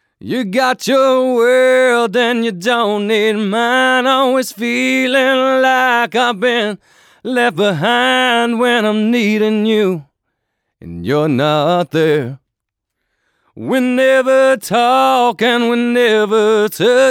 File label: Vocal